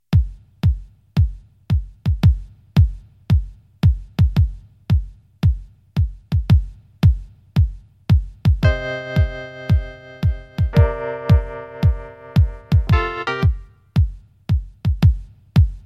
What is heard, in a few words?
song